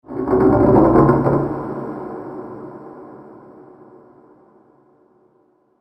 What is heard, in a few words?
As The Crypt Rumbles, horror sound effect; Scary Soundscape Sound Effects